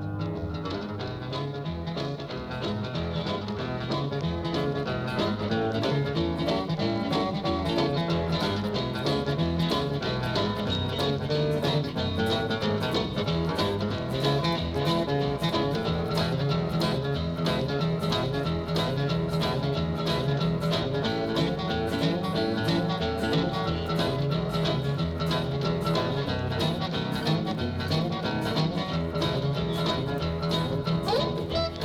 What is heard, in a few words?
Guitar boogie